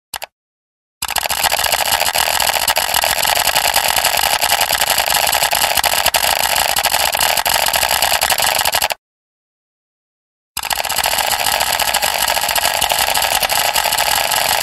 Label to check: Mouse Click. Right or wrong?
Right